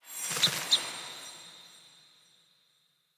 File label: UI Encounter Start